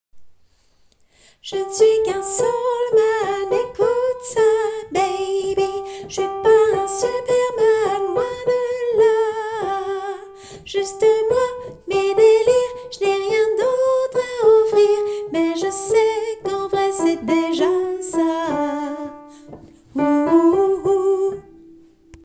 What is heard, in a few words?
soprano